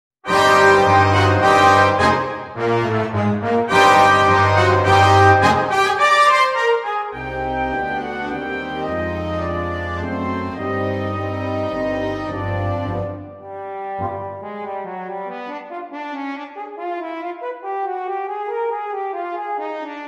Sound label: Romanza - Fanfare